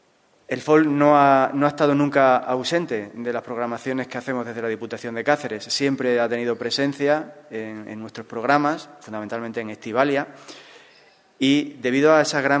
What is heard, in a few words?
Folk; Caceres